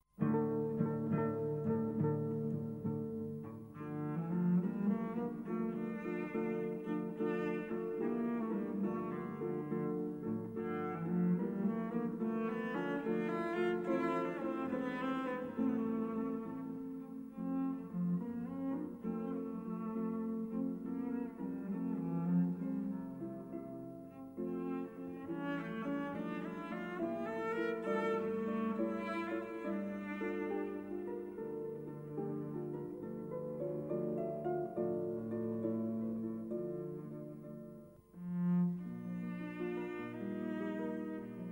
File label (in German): Cello Harfe